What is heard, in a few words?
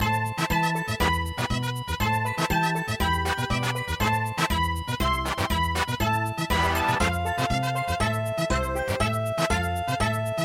Sakura manbo